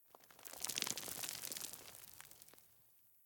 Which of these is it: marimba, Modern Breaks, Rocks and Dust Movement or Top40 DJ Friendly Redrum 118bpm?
Rocks and Dust Movement